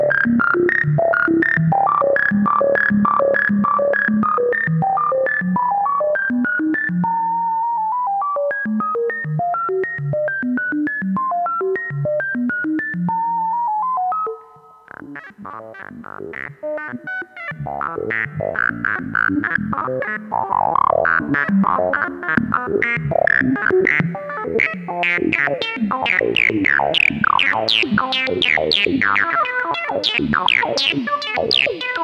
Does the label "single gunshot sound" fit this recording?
No